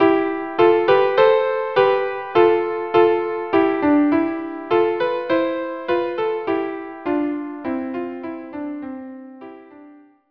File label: viola duo; heldenmusik La Tranquillite